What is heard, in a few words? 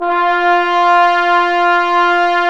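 bones, Bone Sec, trombone section; Roland L-CD702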